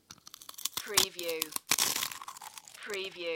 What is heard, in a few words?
Crisp, crunch potato chips, crisproll, crumble